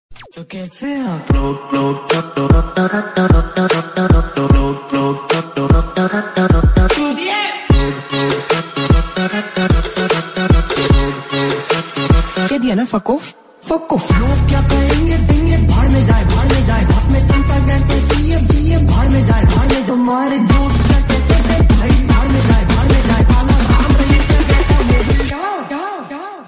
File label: background music; carryminati